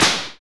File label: FM Perc; Rap